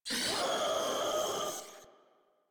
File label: Heavy Bark; Enemies; Vocals; Warframe; Proto Infested Ancient